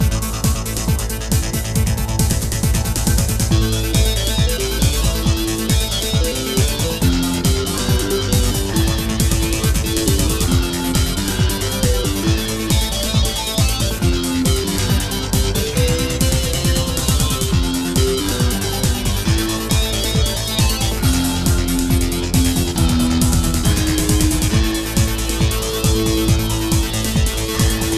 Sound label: techno